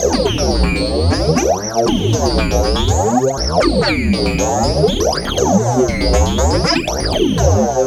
Bass Comb FX, Synth SFX; Sonic Foundry (Sony Creative Software); The Electronic Music Manuscript Collection

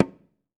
ANOC MUTE, Ritual Drums; E-MU Producer Series Vol. 7 – Old World Instruments

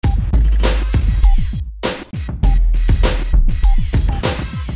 Beat

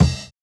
Kick, jungle breaks drum sounds

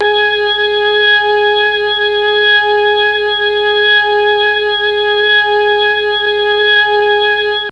G#3 ORGAN, Mellotron; Mike Pinder - Mellotron Archive CD

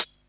HH, HIHAT HIP, Dance Mega Drums; 90 s Sample CDs; Best Service

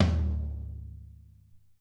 Raw F Tom, Alesis SR-18

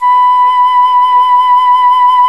FLT FLUTE, Flt vib; Roland L-CDX-03 Disk 1

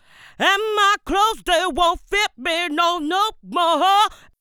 VOX, Funky Vocals; USB Soundscan vol.18

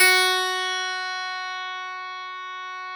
Clavinet F2; Classical Prepared Pianos; Total Piano

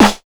RUN 140 SD

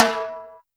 Timb; Roland R-70; Perc

